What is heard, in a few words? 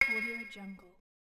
retro game interface positive popup